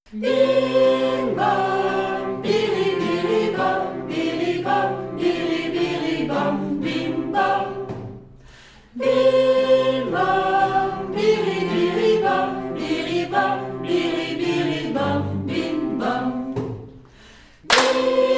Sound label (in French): phrase 2 chantee puis chantee frappee